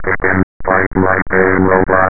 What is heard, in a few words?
chicken